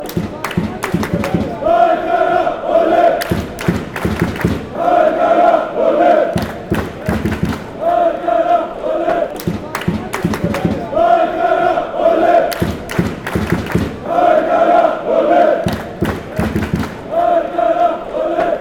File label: ole fanchants; aek